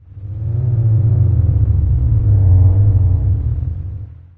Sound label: city truck pass, ambient atmosphere; source engine; hl2; video games